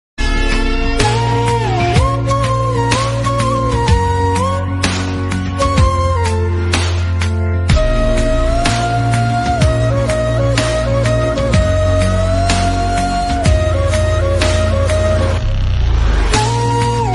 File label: flute ringtone, instrumental ringtone